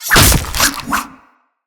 Stealth Dual Dagger Attack; Tenno

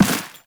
run dirt, footsteps; Warframe; Teshin

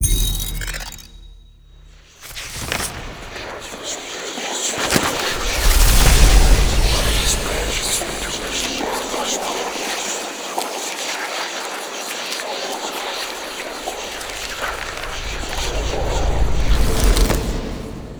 Grimoire Pickup; Warframe; Entrati Labs

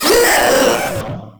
Proto Infested Runner Pain, Vocals; Warframe

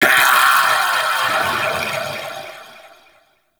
Vocal Death Dissolve; Warframe; Duviri; Dax; Ashigaru

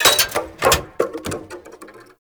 Vending Machine Bottles; Ambience; Props; Warframe; Nineteen Ninety Nine

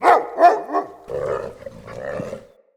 Pack Wolf Alerted Bark; Animals; Perception

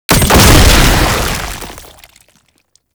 Chem Nox Death Explosion; Warframe; Lasrian Soldiers

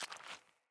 Grunt Boot Walk Water, Footsteps, Boots; Enemy; Sinecure; Melee Grunt

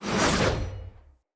Knight Axe Whoosh Heavy; Weapons; Enemy; Axes; Snc Knight Axe

